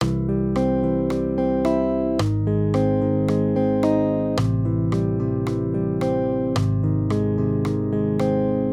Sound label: maj triads